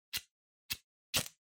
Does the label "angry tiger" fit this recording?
No